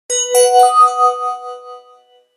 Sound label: Alert Triangle; HTC Touch Viva WM6.5